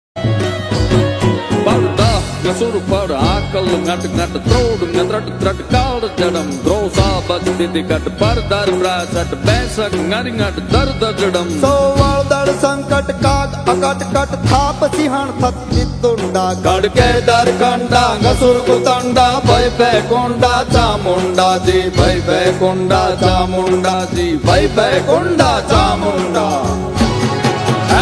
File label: ringtone